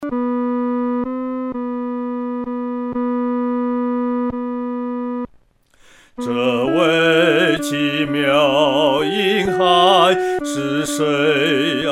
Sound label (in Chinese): 独唱 第二声